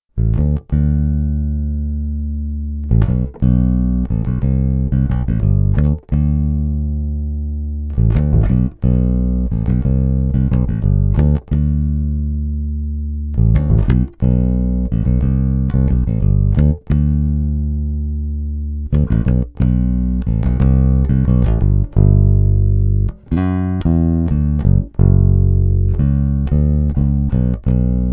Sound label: Shortscale Country Mix, basses